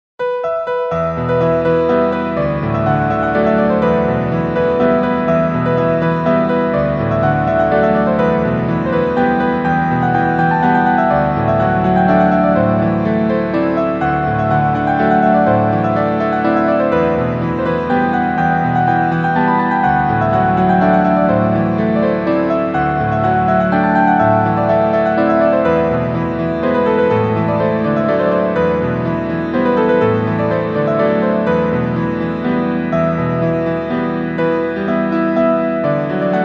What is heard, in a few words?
Piano